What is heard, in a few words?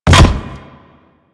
Land Metal, Footsteps; Enemies; Warframe; Grineer